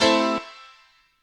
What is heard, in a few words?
Keys